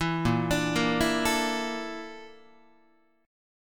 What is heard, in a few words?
Bb Major 7th Flat 5th Bb Guitar; arpeggiated